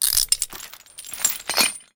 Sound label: shackle unlock